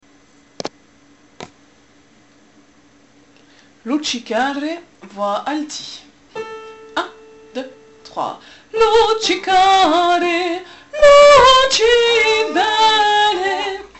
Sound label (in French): nocturnes alti; luci care